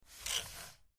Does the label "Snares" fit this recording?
No